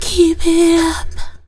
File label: FEMALE VOX, Hip Hop; E-MU Formula 4000 Series Vol. 1; Hip Hop Nation; Phatt Phemmes 4